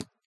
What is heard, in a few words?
foley concrete step run; sw foley six concrete